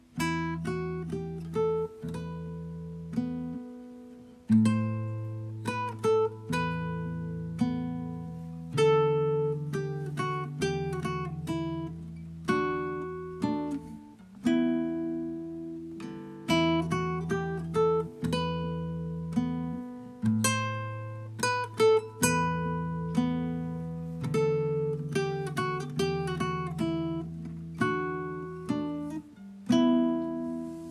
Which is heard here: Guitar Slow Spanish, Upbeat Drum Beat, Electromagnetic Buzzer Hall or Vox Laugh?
Guitar Slow Spanish